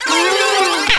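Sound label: ANT attack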